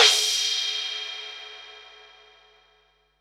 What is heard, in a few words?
HH, Pearl SC-40 Hihats and cymbals